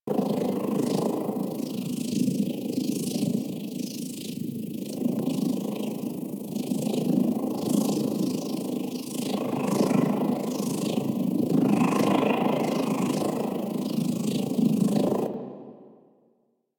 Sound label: Speedball Catch Vortex Loop; Warframe; Missions; Tenno Ball